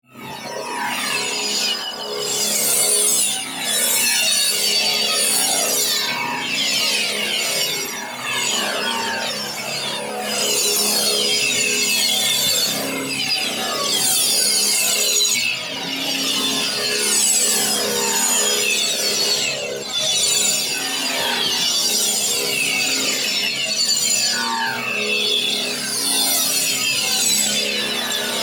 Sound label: PARTICLES SMALL SLOW Fluctuations; boom library; Neon